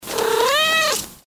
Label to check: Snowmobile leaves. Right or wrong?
Wrong